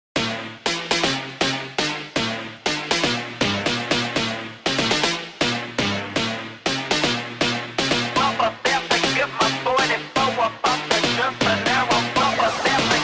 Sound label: phonk фонк